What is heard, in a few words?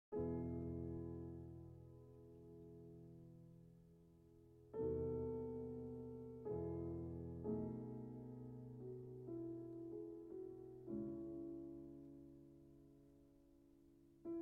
Piano Concerto in C Minor, Largo; Beethoven; Piano Concerto No. 3; Op. 37 II.